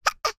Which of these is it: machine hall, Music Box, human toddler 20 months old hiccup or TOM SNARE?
human toddler 20 months old hiccup